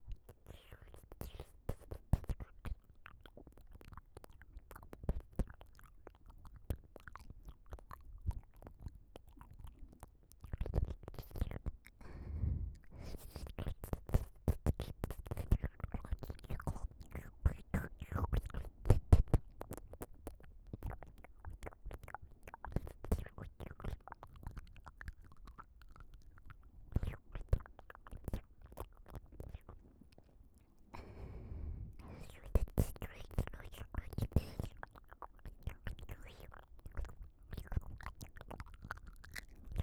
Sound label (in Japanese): 耳舐め右; セリフなし差分